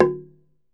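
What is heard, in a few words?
TUNG LO, Tongue Drums, Pitched Percussion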